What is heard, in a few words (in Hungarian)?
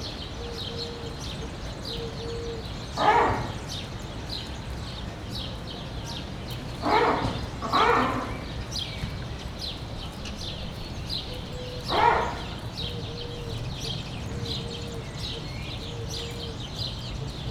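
egymagában, a labdarúgás bőbögése, vari; debreceni zoo 2012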